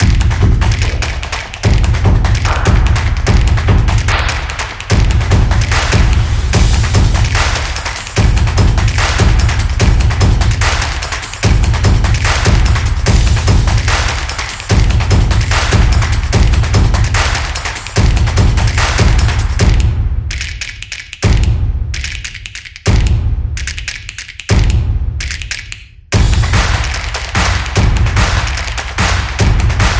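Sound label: The Football Music, Football percussion background music inspired by samba rhythm, stadium energy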